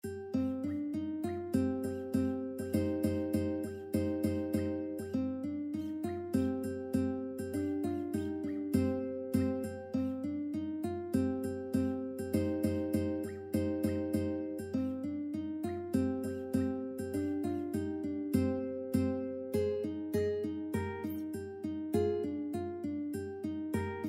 ukulele

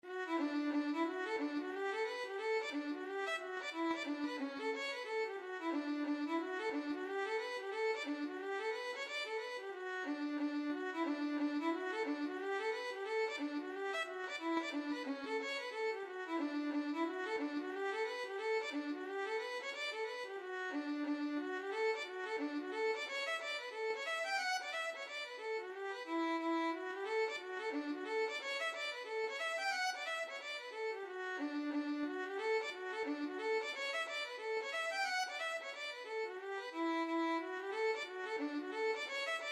hornpipe, violin

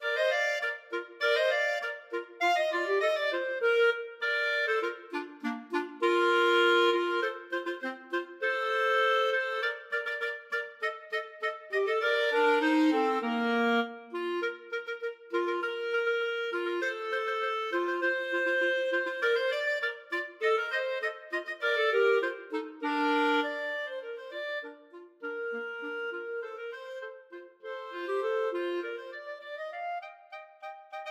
clarinet duet

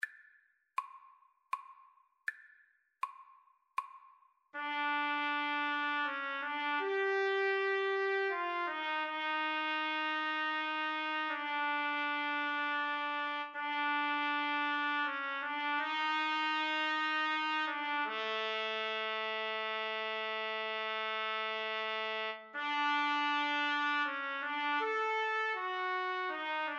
trumpet cello, Trumpet-Cello Duet

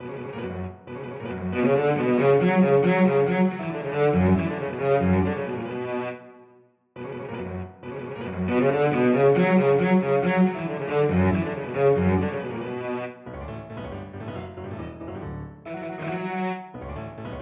anvil chorus, cello

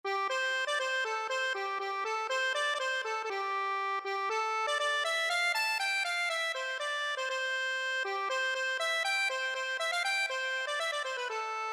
accordion

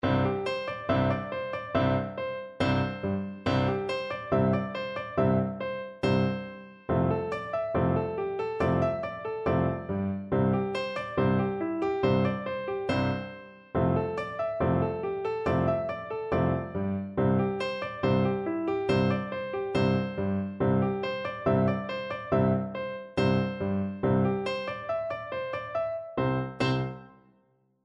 tchaikovsky 1812, piano duet

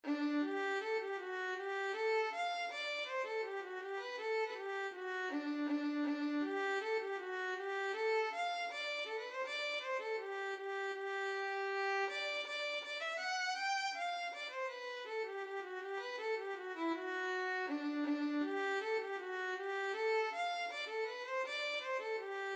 ocean tide, violin

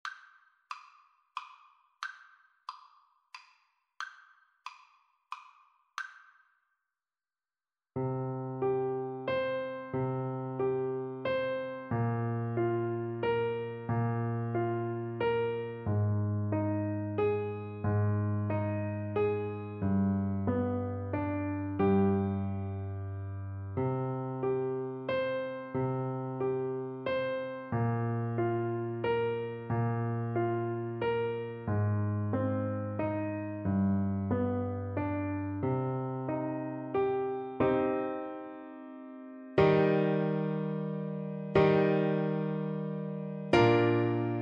greensleeves, oboe

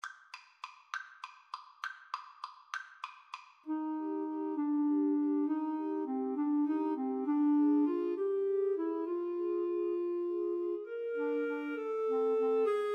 Clarinet Trio, waltz

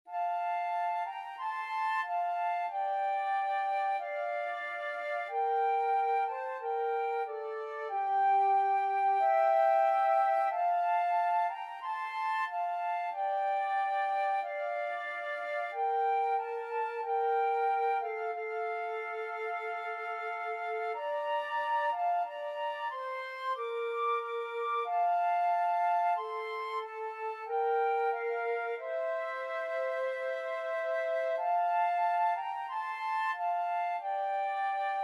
bleak midwinter flute duet

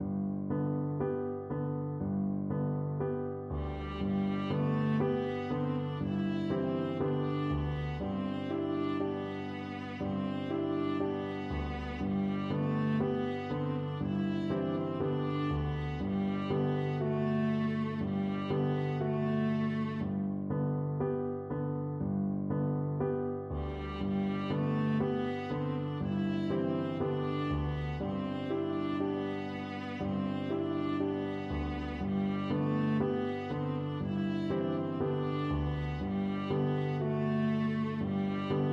irish lullaby, viola

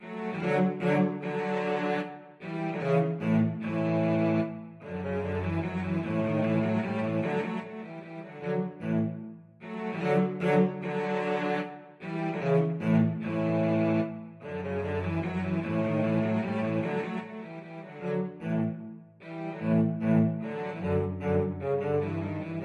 duos menuett cello

